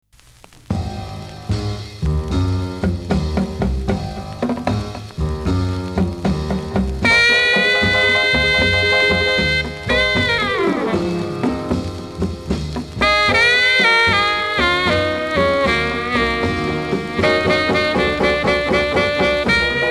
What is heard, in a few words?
78rpm, Saxophon